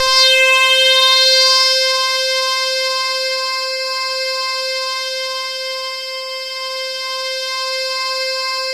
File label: THE BUZZ, KURZWEIL; BASIC-KW2000